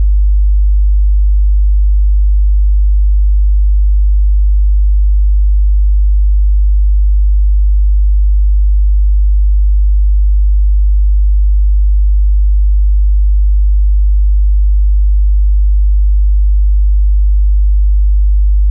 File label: 50hz -5dbfs